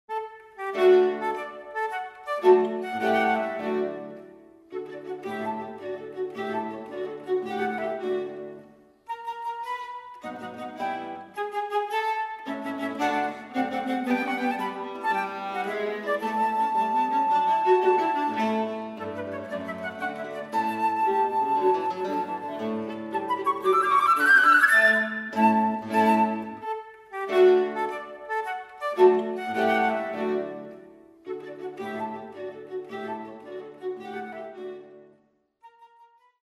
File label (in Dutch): Serenade Op 8 Voor Fluit Altviool En Guitaar Marcia